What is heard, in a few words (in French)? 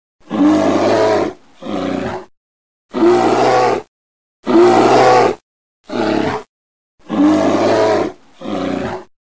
Son grognement de l'ours